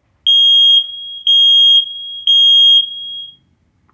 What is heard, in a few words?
firex smoke alarm kf20 test sound